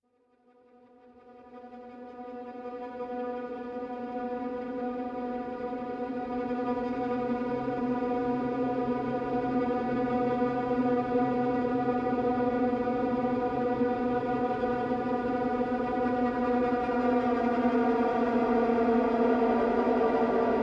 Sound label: String Drone, Deep Nordic Drones